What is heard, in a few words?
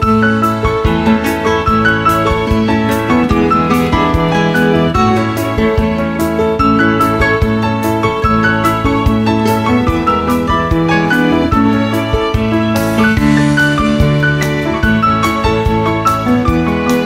Kitty on keys